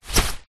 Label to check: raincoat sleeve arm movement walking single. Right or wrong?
Right